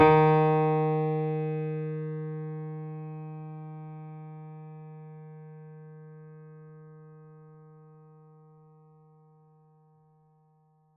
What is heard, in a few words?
mini grand; bananenkeyboard